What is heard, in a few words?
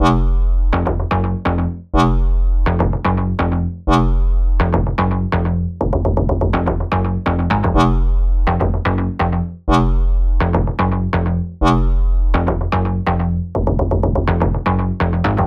bass dirty F m 124